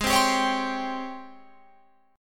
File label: G sharp Major 11th G sharp, Guitar Open F; 20,19,19,20,22,19; JGuitar